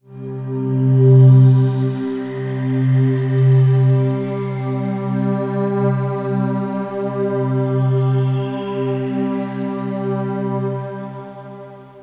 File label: PAD (C), Pedal One Shots; Respect; Cymatics; ANALOG EVOLUTION Beta